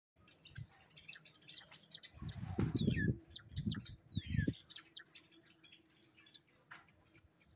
Java sparrow

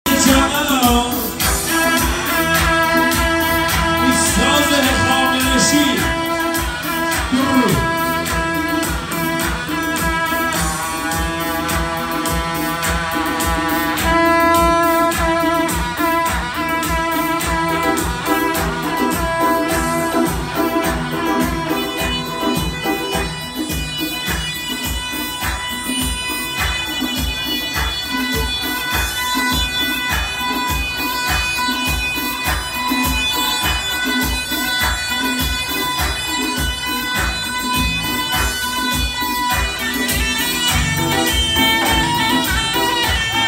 saz hakhamaneshi, بختیاری; ساز هخامنشی

